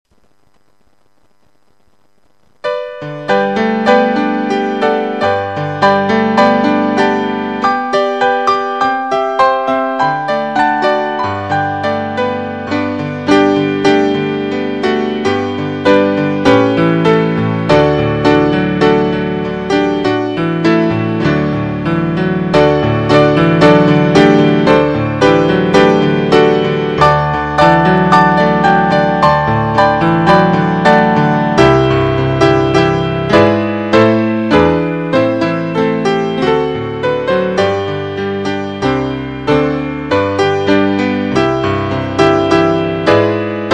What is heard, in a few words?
Christmas Piano Hark The Herald Angels Sing